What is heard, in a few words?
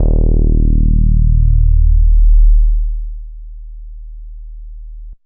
J Bass, Bass One-Shots, Drum n Bass; KJ Sawka - Drum n Bass & Beyond